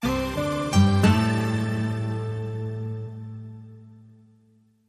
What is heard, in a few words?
Breaking News Logo Only